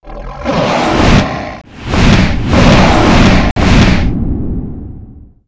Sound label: dragon idle